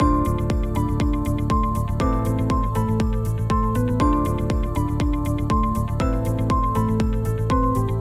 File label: Test Track mix